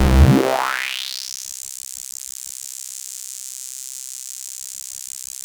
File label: CUTOFF, Analogon; CMP - Analogon